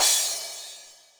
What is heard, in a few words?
Akai XR-20, Drum Machines, Cymbals